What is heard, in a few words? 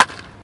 ball; baseball bash